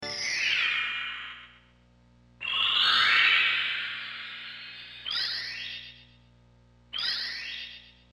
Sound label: defeat; проигрыш; bowser; Звуки из игры Марио